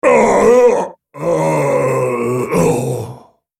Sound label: dying; Volibear